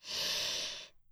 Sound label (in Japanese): ブレスロング